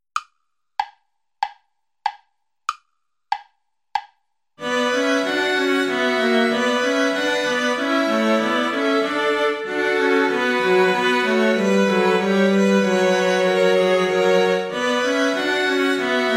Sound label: play along without violin